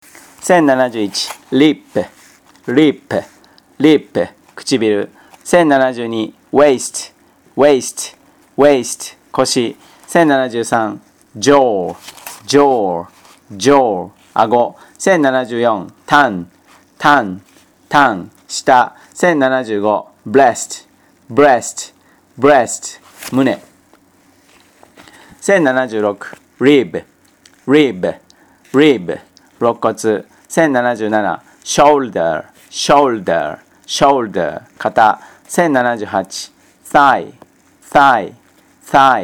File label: tango